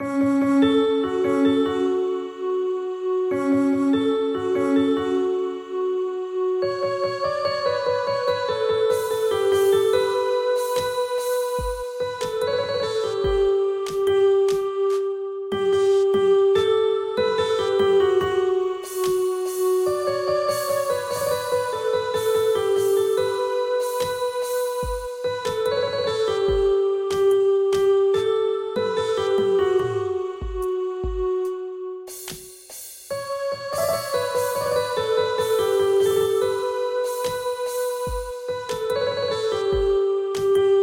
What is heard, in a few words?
keyboard